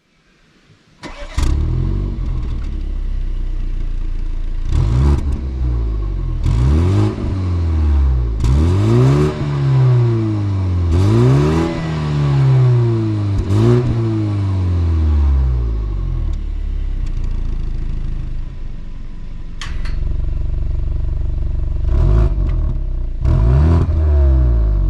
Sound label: bmw 230i